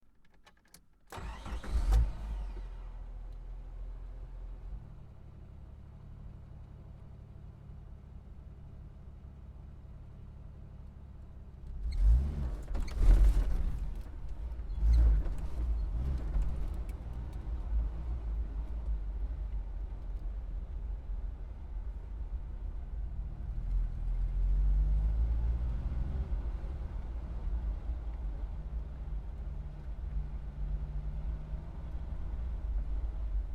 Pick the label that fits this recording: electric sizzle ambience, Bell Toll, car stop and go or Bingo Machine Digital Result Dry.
car stop and go